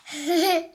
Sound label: hehe